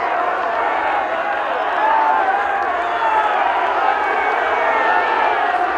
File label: BMX Crowd Boo Loop; Crowds